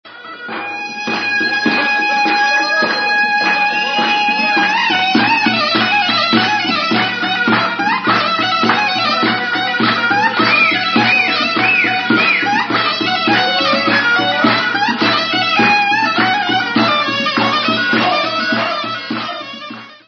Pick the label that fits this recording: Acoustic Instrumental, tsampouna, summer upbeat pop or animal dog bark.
tsampouna